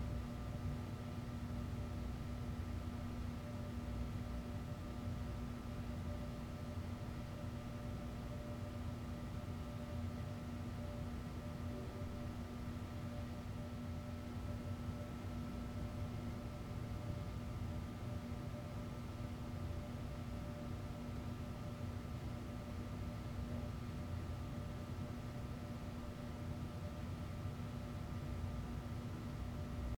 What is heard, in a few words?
indoor school hall; anemoi